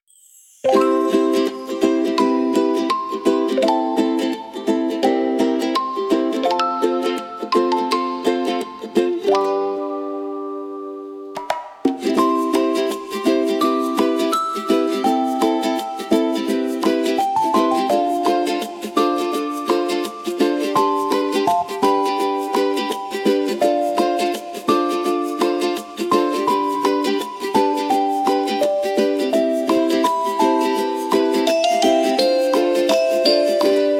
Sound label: ukulele nap